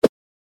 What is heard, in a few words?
Puzzle game tile swap; video game